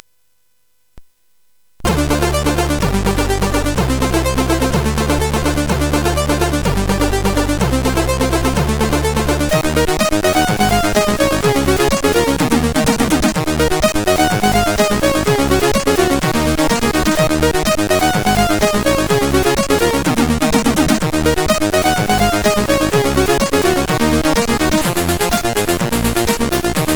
Intro, CSG8580R5; X-ample; hvsc

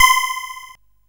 Blast Ball, CSG8580R5

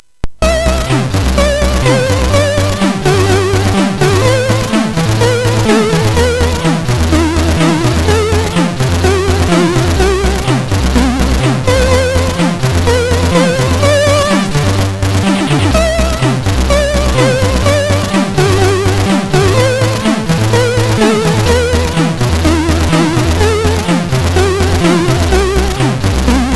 Russian anthem sid MOS6581R4; hvsc

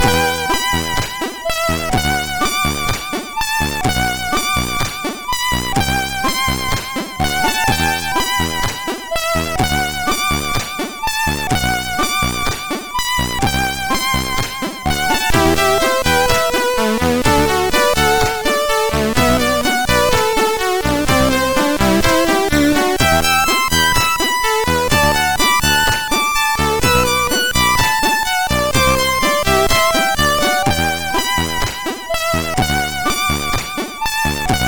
Polyphony, MOS6581R4; hvsc; Extern

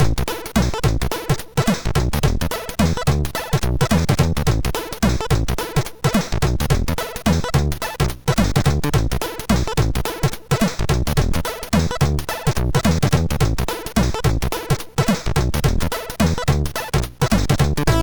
Funky, sid MOS6581R2

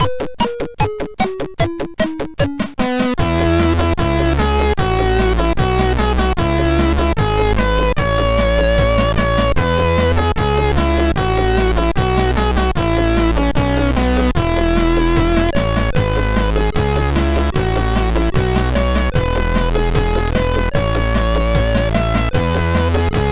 tune, sid MOS6581R3

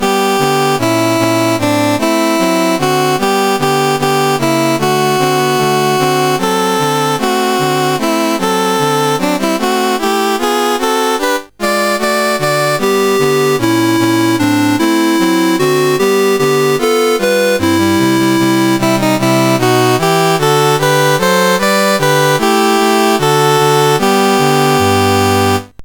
Push on Song, University Fight Songs; Dave Marquis

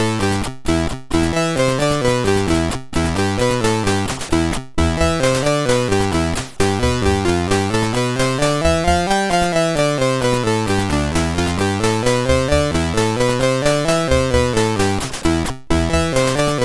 remix, sid MOS6581R3

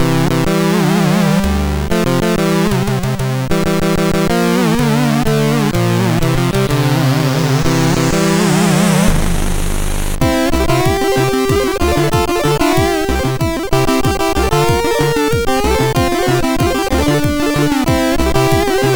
sid MOS6581R4; Jeff for fean-far; Musicman

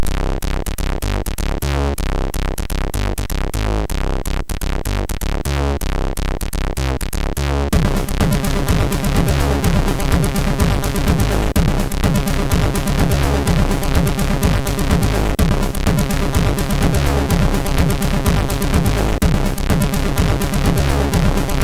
Vibrations, MOS6581R3; Vibrations tune 3; hvsc; Froyd

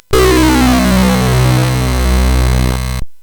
Synth Sample, CSG8580R5; hvsc

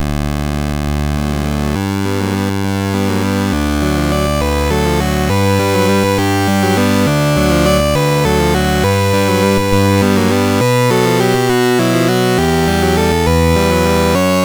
Grand Slam Baseball, sid MOS6581R4

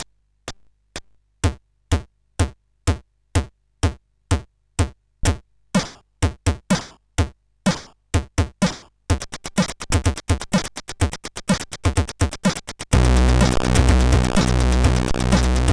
New Tune, CSG8580R5

